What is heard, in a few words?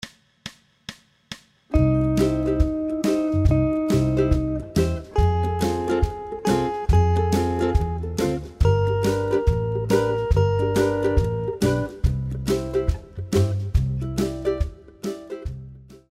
min 3rds only